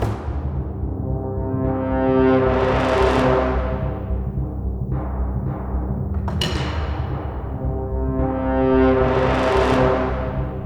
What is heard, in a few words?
Music Old Desperate Battle LOOP; Knights & Guns